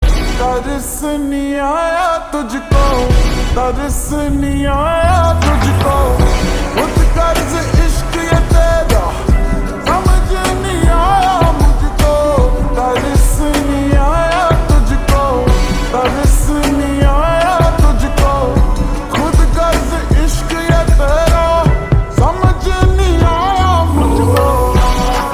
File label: Ringtone slowed reverb